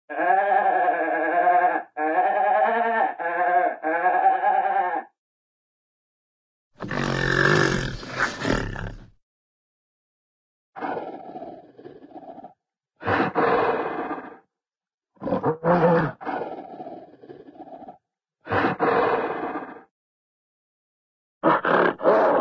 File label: goat single goat bleating, animal; cartoon